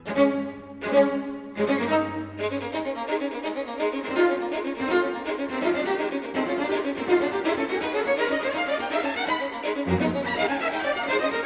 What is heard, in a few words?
String Quartet